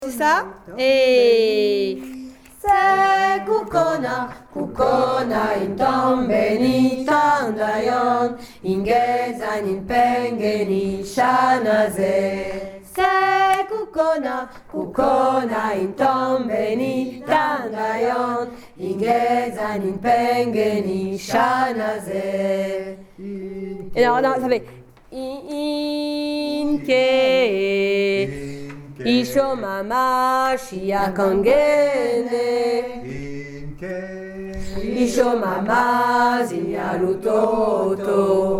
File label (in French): Kukhona basse groupe